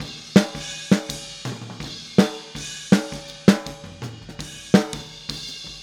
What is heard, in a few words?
Boogaloo Fill Snare, 82 BPM, Drums; Sonic Foundry (Sony Creative Software) - Drums from the Big Room The Mixes